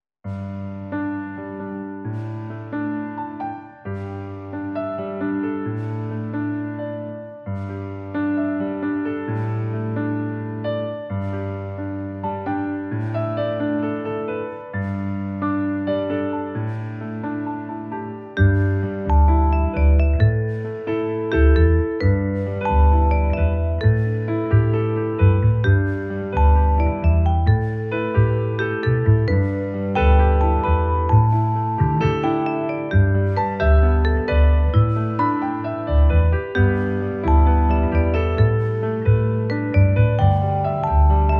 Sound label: EASTERN PIANO THEME